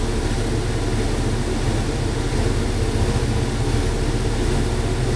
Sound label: pipe ambience